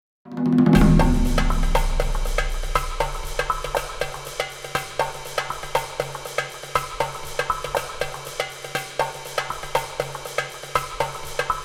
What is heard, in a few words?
Ghost Forest Combat; The Witcher 3 Wild Hunt